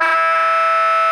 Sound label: SOPRANO E 4, Saxophones, GRWL; Greytsounds; Philip Wolfe David Crozier's Saxophones